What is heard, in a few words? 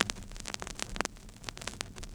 Crackle, Vinyl Noise